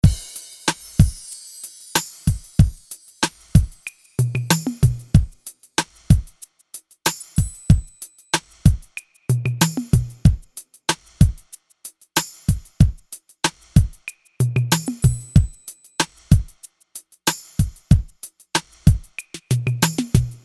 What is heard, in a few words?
Drums Original